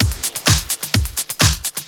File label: Clubhouse Loop 128 BPM